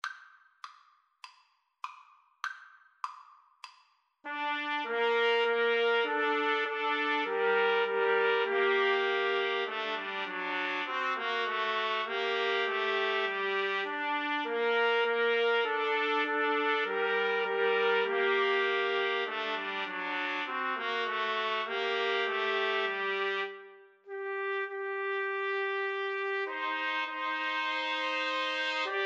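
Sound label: trumpet, karaoke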